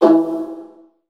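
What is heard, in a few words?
viola pizzicato E2 right, Virtuoso Strings; ILIO; Kirk Hunter Virtuoso Strings VOL-2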